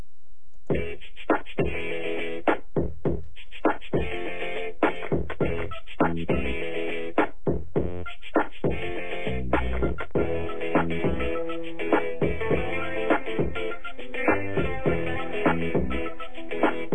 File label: beat